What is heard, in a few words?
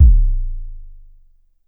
Drum X Sub, Pearl Drum-X